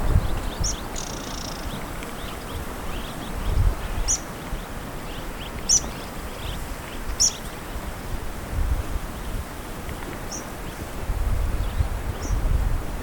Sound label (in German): Bergpieper ssp. coutelli Rufe im Flug; Cape Greco CY